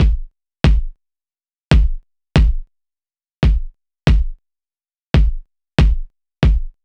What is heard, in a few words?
140 kick dry